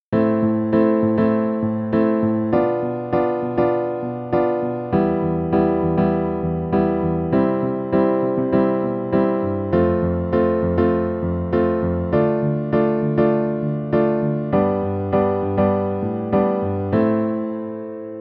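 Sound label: minor chord piano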